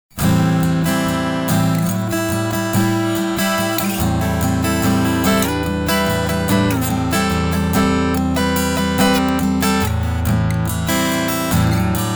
Bass u Höhen up CT Full

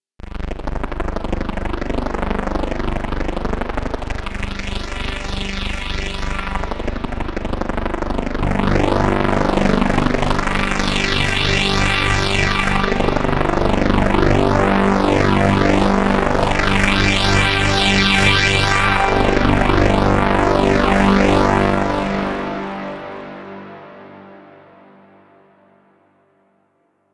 Waldorf Streichfett Plug In sehr tiefe Frequenzen

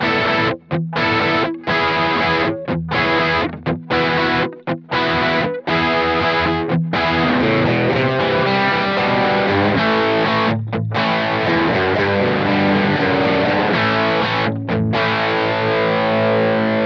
Soundvergleich Crunch Cort Links Ibanez Rechts

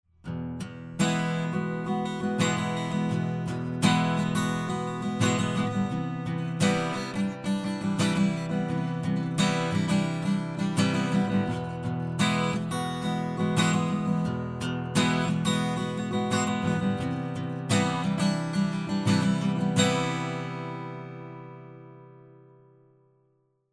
WA 47 Git Kugel

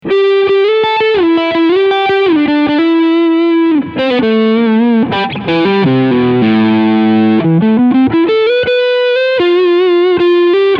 Leadsound Hals P90

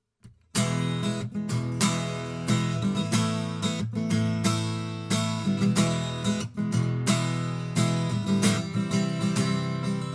Akustik Gitarre High Pass

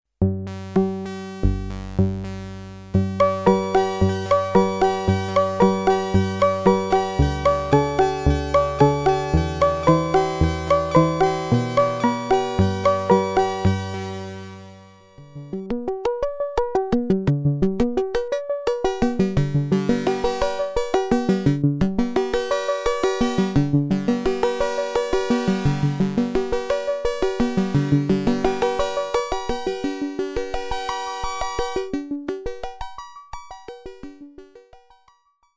P600 Invertierte Filterhuellkurve; gligli

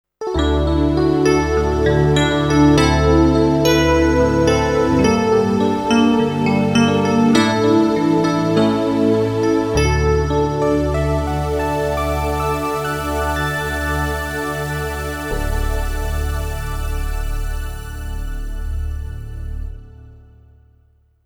Montage DX EP und Pad

